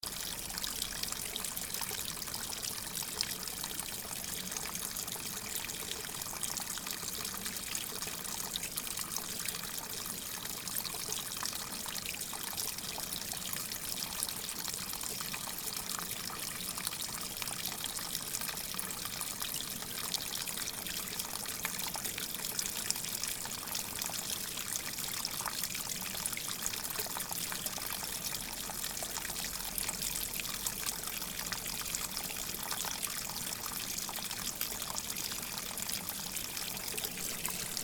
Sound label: water creek sound